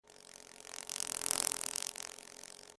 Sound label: Insect Wings Fly, Insect, Wings, Fly, By, Bug; Blastwave; Animals:Insects